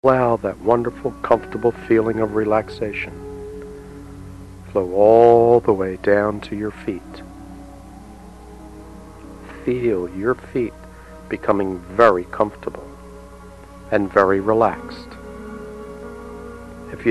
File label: Sample of Ultra Deep Relaxation